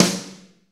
snare, Slow Shuffle; Northstar; Drumscapes Roland

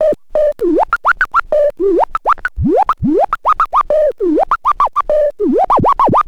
scratch med, Vinyl Attack scratches mix

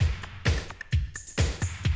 130, Classic DrumLoops

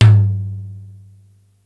tom, Yamaha RY-8

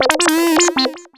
lead (brass)

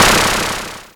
snr; Mono

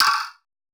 ICE CUP SNARE

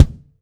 BD, Bassdrums, Roland TD-20

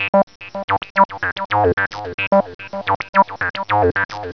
Synth Arpeggio; Sonic Foundry (Sony Creative Software) - Crimson Blue and Fabulous Horncraft 4 RnB

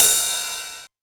909 Ride, Drum Machines; Novation DrumstationV1

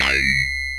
ELECT PERC; OMI - Universe of Sounds

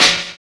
DR. LOOP, DRUMS; X-Static Goldmine 3

